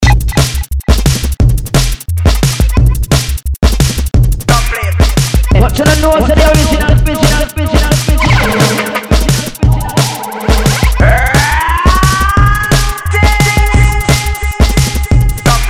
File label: JUNGLIST RAGGA DUBZ